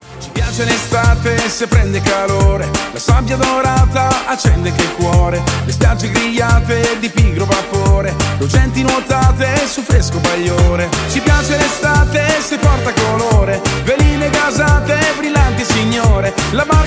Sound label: mambo windsurf